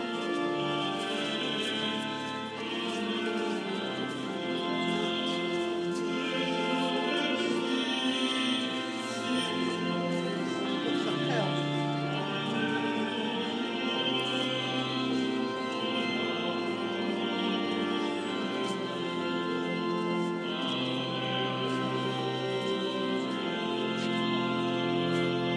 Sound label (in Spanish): organo de la catedral de Barcelona